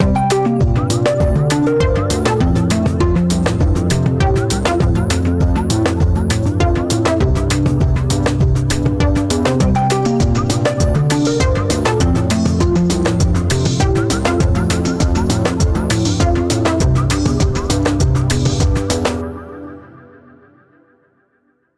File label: Melodies